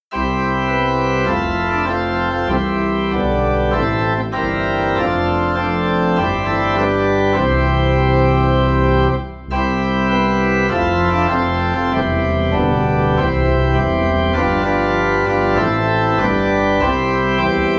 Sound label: Go Tell It On the Mountain - Organ Low in C; christmas